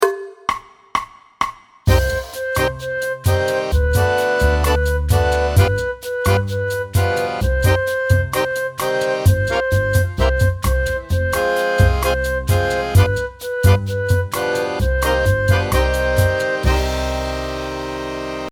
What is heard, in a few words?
flute backing track; lesson 2